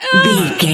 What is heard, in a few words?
voice female effort push fast